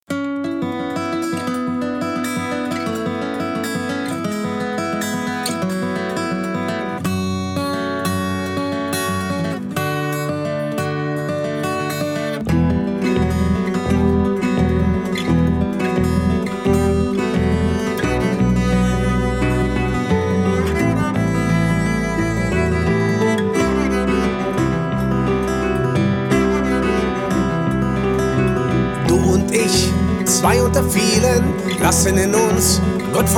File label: Radio Unplugged